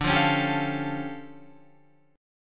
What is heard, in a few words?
D Minor Major 11th down Guitar Open F; DmM11 strummed; JGuitar